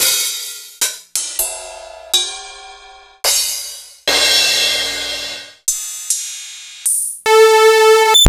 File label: 8O8; proteusvx; Synthax